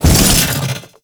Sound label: Jump, Foley; Warframe; Sentients; Erra